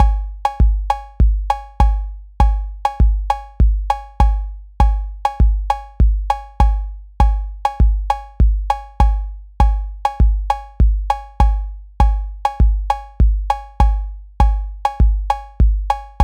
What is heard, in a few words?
cuban 2 3 clave